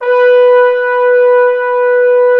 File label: BRS FF BON, Bone Sec.1; Roland LCDP06 Brass Sections